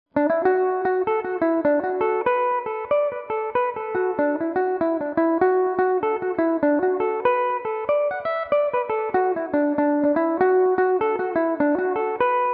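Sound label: D major belmuse, irish mandolin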